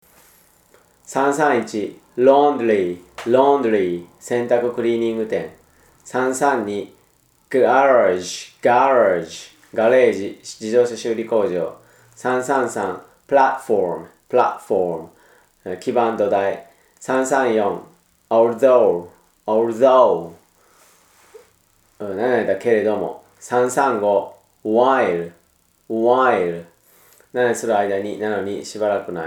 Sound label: tango